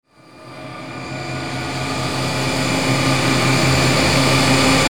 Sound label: Sweeper Long Suspense Abrupt; Blastwave; Production Element Imaging Element Accent Transition